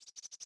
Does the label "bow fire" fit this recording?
No